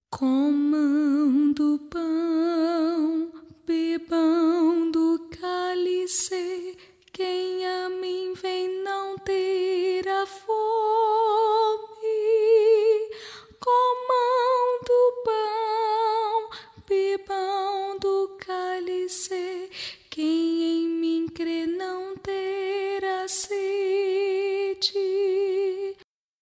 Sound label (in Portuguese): Soprano